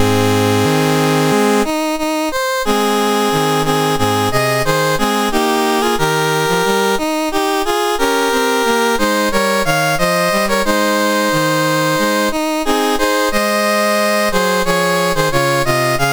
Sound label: MOS6581R4, National Anthems; Perak